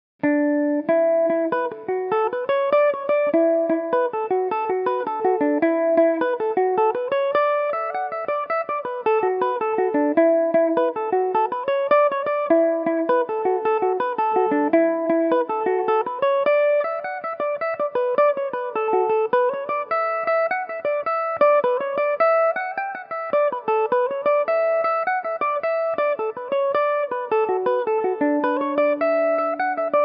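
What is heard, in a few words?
E dorian, irish mandolin; horses leotard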